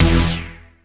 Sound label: Synth Hit E; Zero-G Trance Inducer; 90 s Sample CDs